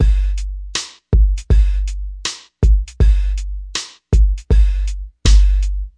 put yer pants on hip hop, drum loops and breaks, 080 bpm; 000 to 080 bpm